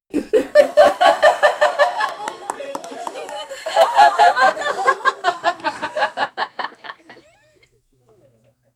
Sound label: 90s sitcom laugh track